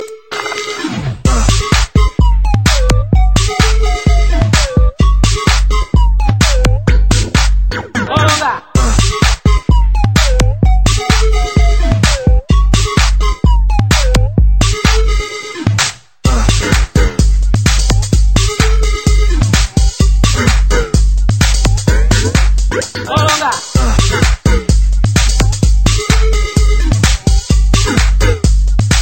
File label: Bouncing Beat